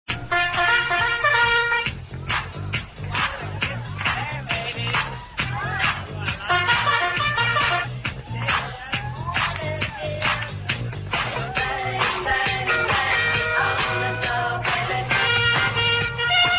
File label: bugle